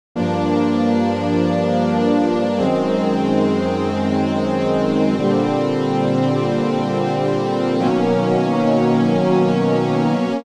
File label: cutoff, Virtual String Machine; gforce; vsm; freeman